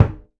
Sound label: kick second line thump